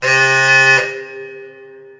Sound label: bridge signal; Mafie 1